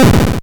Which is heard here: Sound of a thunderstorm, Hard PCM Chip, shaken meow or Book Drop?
Hard PCM Chip